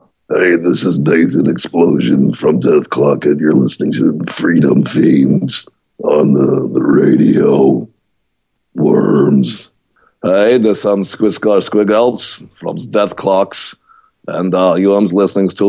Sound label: Ring Tone; Freedom Feens